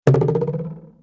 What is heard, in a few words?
cartoon bounce; TECKNAT